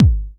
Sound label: Bassdrum Clean; Best Service; House Definition VOL-1